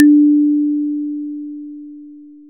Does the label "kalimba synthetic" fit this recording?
Yes